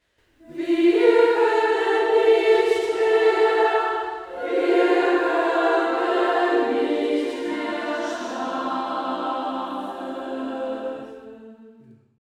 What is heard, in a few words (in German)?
Auftakt Takt 35 ff A 2 im Tutti